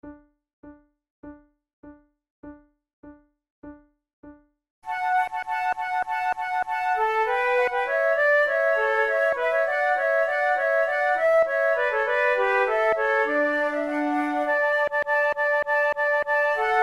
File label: bells trio